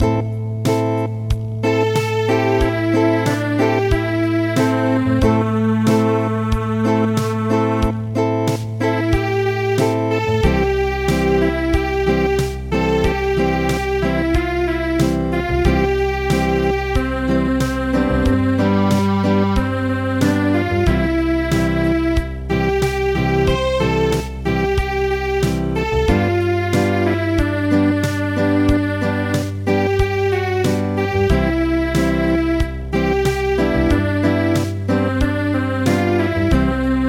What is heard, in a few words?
Acoustic, traditional